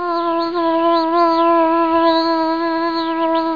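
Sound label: fly buzzing; kunchong jiaosheng; cangying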